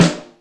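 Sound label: Tosh Lo fi, Yamaha DTXpress Acoustic Snare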